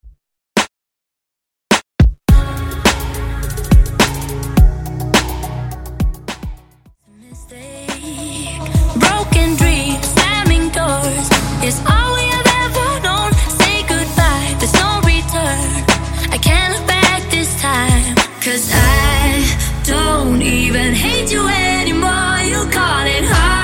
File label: Redrum